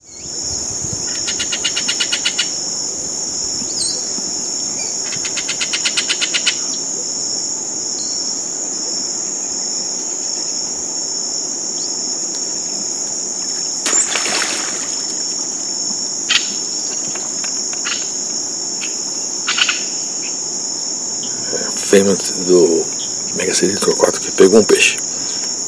Megaceryle torquata; I Comandatuba

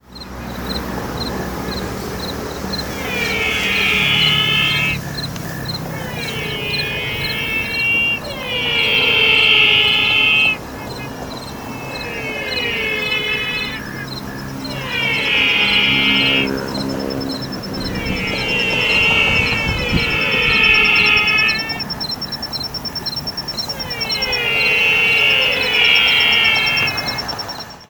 Physalaemus gracilis noite; P del Este; Silveira

